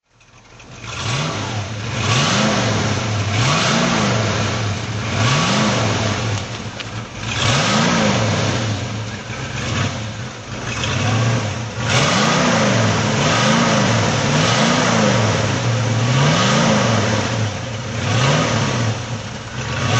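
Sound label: corvette throttle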